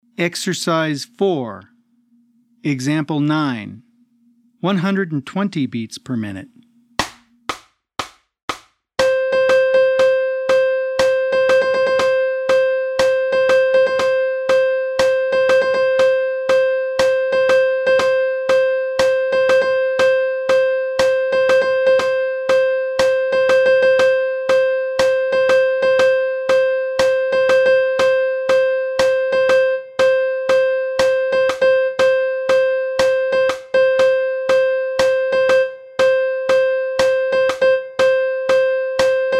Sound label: 120 BPM